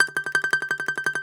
DOMRA, Russian Strings

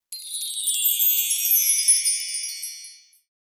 Roland Juno D Perc Menu Kit

